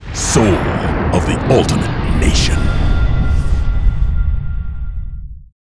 logo

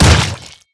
axe; impact

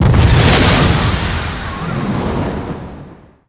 fire bottom

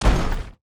foot

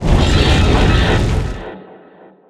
attack up

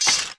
hold steel weapon

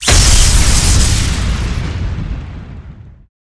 Lightning Fall

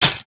Defence

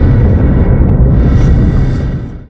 fire on; GameClients KR

